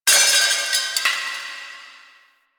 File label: Metal Debris; Ambience; Warframe; The New War; Quests; Zariman